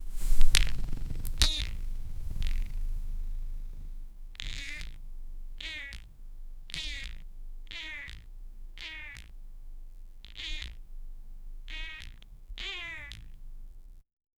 cat meowing; animal

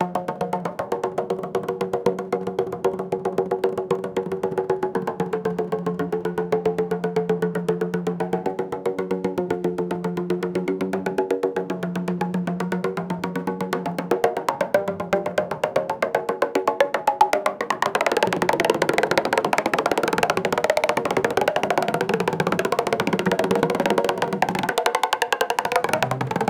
a tabla enters with a, ethnic

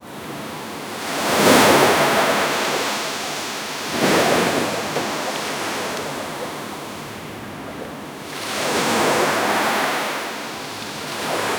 sea in heavens, clouds

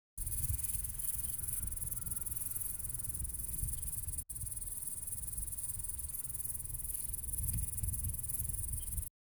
tool

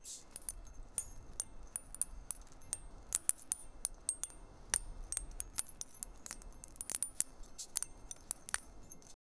tiny clicks and snaps of

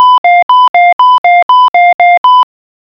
count down timer sound; round end

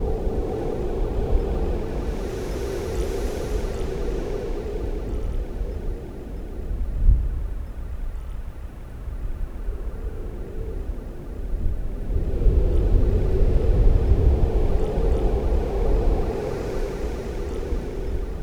binaural asmr of calm bre, sea breeze

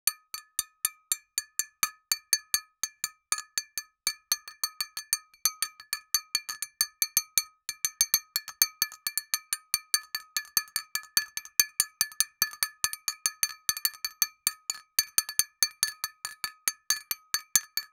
sound of spoon stirring

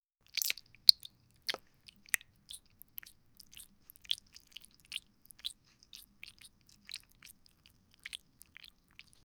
asmr sound of squishing, liquids

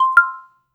cute dating app notificat

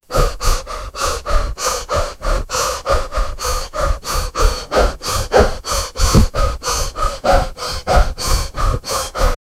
dog breathing heavy sound

